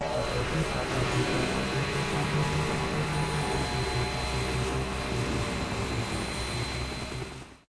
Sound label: idle, monster